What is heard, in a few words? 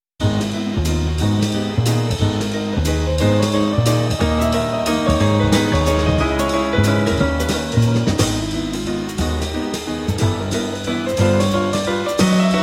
In G-Sharp Minor, Jazz Ensemble